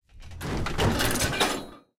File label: rocket reload end, tanks; War Thunder